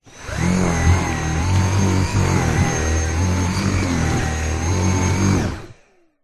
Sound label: household vacuum cleaner vacuuming sofa fabric close up head short; zapsplat